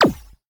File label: laser; factorio; Factorio 0.14.21; fight